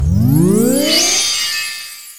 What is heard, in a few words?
teleporting